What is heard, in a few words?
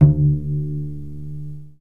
pluck, cello